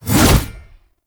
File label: Lunge Impact Pre; Warframe; Oraxia